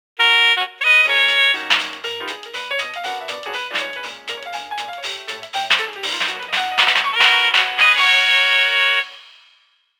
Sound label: Radio Jingle (Evening); Animal Crossing New Horizons